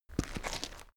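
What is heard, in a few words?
step gritty stone step, footsteps; schritte; menschen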